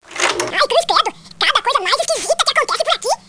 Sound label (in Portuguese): Telha; GEOGRAFIA